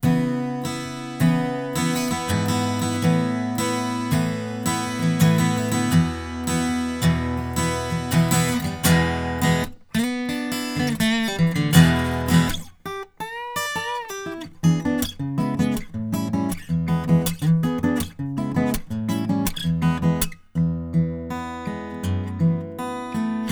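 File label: Reflections Acoustic Guitar Dry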